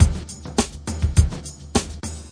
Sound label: reverso snares, 103 bpm, drum loop; 101 to 110 bpm